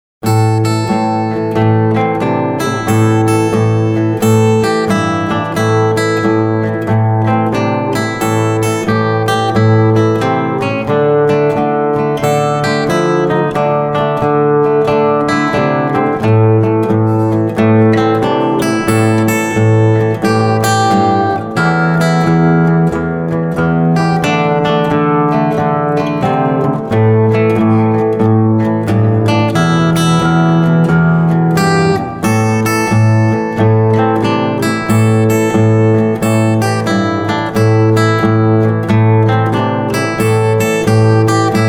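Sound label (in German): Gitarre Solo; blues; ragtime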